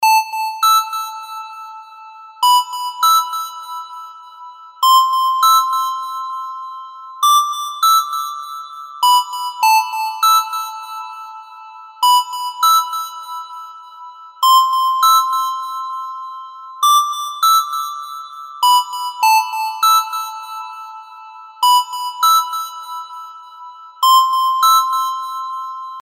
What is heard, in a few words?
iphone 13 pro max ringtone